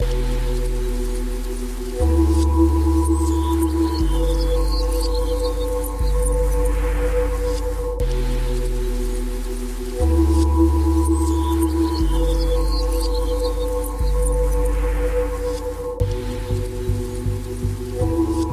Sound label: Deep Ocean